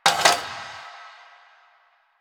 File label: Cafe Chair Short; Warframe; Ambience; Nineteen Ninety Nine